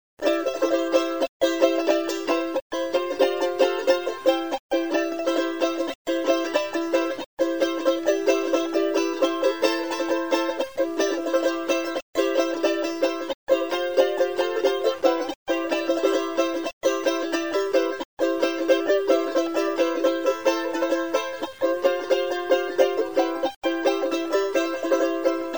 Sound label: minero, charango; folklore